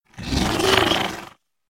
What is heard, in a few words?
raptor attack; Henry Danger - Day Of Danger!